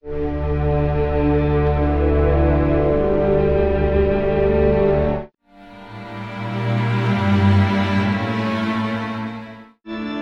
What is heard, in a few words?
strings swells, Sad Loops